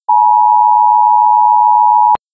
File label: emergency alert; cstrike; biohazard